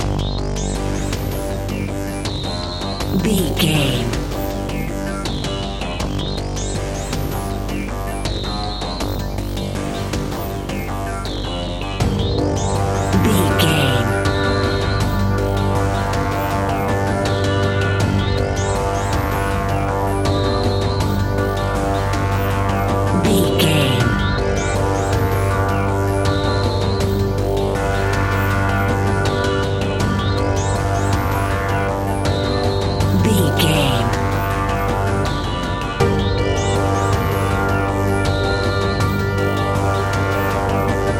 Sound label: broken electronic tension